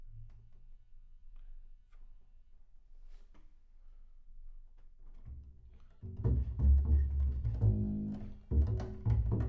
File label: Bass Room